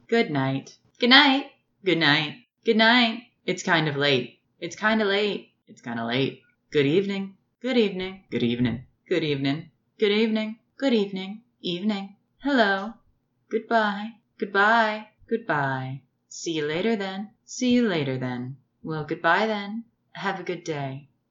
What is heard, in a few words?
Female Alto Goodby